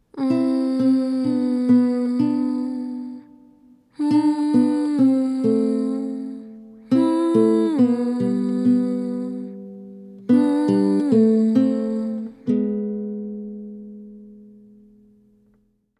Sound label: Fanfare